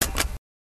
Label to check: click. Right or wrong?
Right